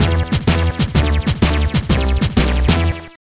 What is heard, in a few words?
Unused Jingle; zapper one wicked cricket; gba